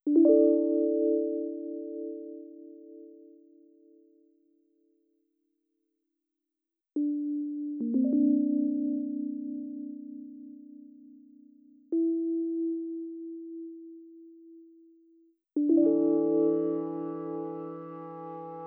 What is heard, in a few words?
film music